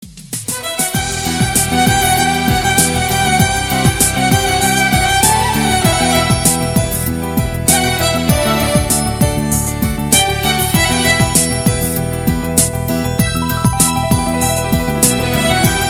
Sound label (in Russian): Музыка со скрипкой на звонок